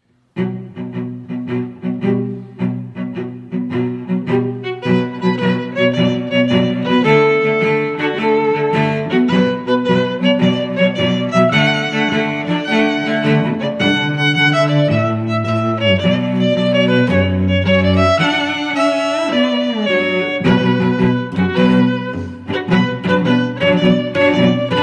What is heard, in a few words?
emotional ringtone; theme of kalki